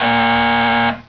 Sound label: lost medal; svencoop; scxpm